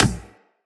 Beach Ball Kick; Water Fight

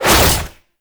Fist Claw Whoosh; Weapons; Warframe; Tenno; Incarnon

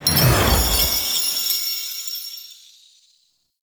Offering Generating; Warframe; Cetus; Cetus Invasion

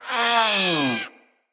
Feral Vocal Death; Catbrow

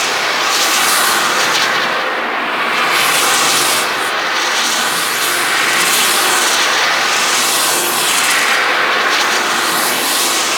gas air vaccum loop; Warframe; Corpus Gas City; Ambience

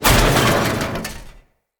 Internal Ship Damage; Vehicles; RailJack; RailJack Hit Effects; Railjack Hull Hit Effects Internal

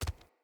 Walk Default, Footsteps; Characters; Enemy; Mockeries; Mockery

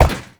Step Metal; Warframe; Uriel

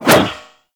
Golden Band Staff Whoosh; Warframe; Monkey King

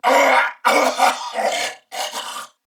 Death Corpus Venus Male, en; Dialog; Barks; Battle; Warframe; CorpusVenusMaleB

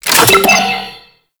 Download Context Action; Warframe

